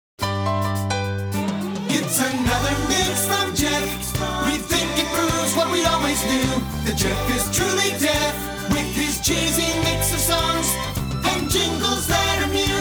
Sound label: Jinglebells